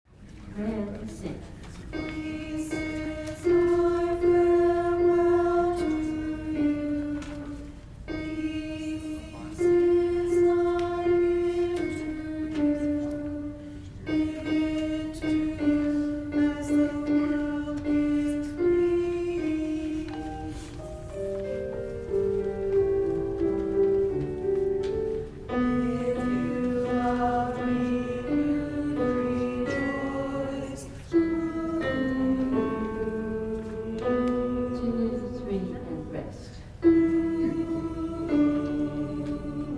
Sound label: altos